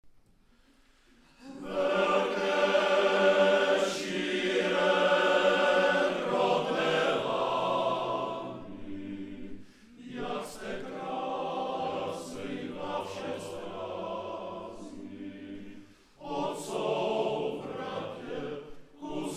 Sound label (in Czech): velke sire